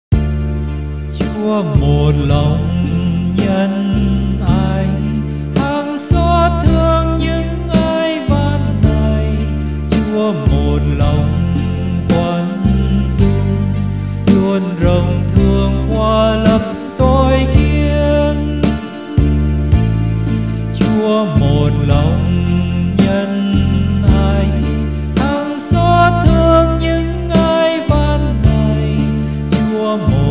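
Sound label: soprano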